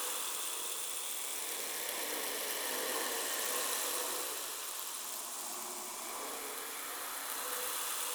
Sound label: Shrine Portal Ember Loop; Objects